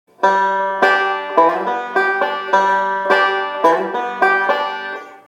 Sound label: lick (2-5 slide) variation